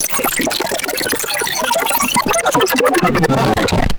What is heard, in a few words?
rapid high pitched tape rewind